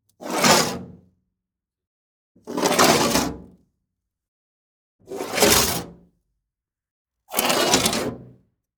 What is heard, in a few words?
Scrapes and Scratches, Metal; SoundBits